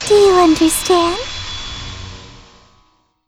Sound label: despair; dr2